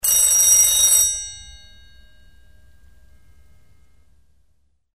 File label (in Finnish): puhelin